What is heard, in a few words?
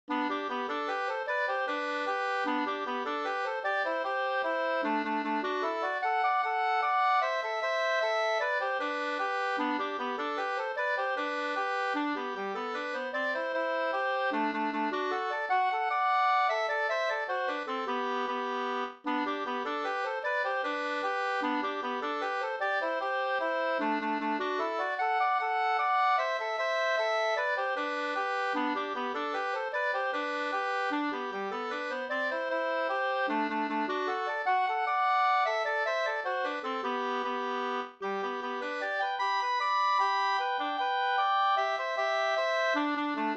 mazurka; Brors mazurka e Bror Andersson Flen 2st